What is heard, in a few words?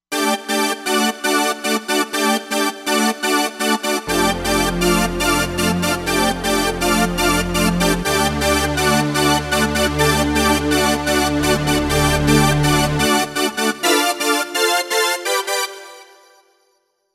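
Lead Fat Hook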